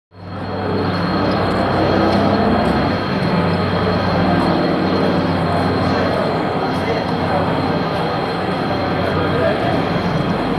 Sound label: Airport Exterior